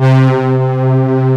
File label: X-PANDER, Analog Fantasies; USB Soundscan vol.49 - Analog Fantasies; AKAI